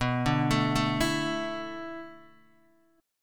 B Minor 11th B down Guitar Open D

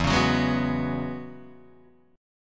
E 6th Add 9th E down Guitar Open D

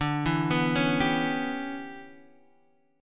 E Minor 6th D, Em6/D chord; arpeggiated; JGuitar

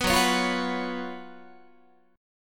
F sharp Major 7th Suspended 4th, Guitar; F#M7sus4 strummed

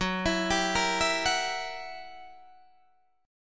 Gb 7th Flat 9th Gb Guitar Open D

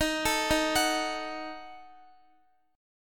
Eb Diminished, Guitar; Ebdim arpeggiated

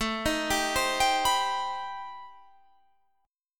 C Minor Major 7th A

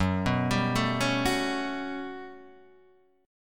G Major Sharp 11th Gb, chord

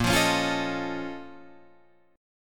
A sharp Minor Major 11th, Guitar, Drop D

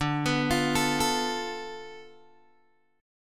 D Minor 6th Guitar Open G

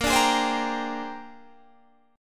A sharp 13th, Guitar Standard; strummed; JGuitar

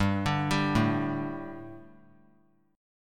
G Suspended 2nd G, down; Gsus2 arpeggiated; JGuitar

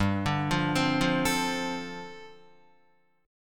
G 9th Suspended 4th, Guitar; arpeggiated; G9sus4

